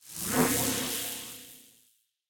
Bolagrande

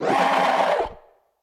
Grito

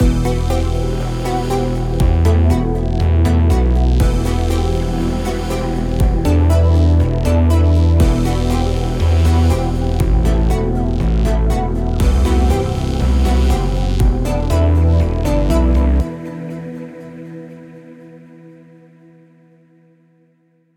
Floresta Infestada Intro; super mombo quest

